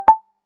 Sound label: phone, taxi